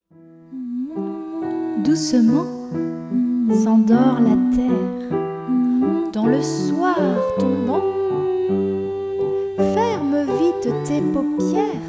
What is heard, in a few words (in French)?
berceuse cosaque